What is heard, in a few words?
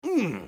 bear wound; eft